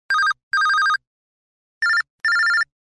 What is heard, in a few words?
Simple Beep; LG; CX230